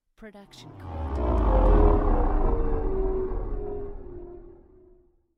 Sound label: star cruiser flying; soundscrate